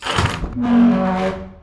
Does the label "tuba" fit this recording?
No